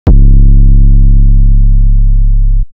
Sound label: Not Nice 808's